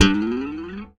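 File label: slap, bass; Xsample VOL-1; SLAP+THUMB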